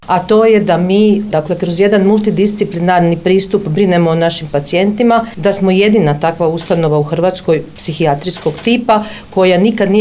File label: POP